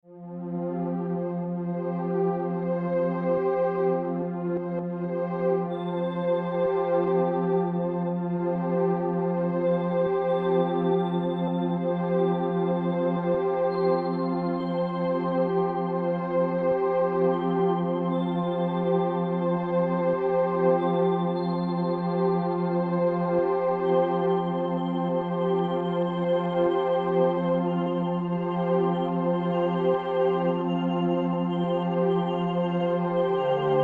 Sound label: waves